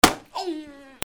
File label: PEOPLE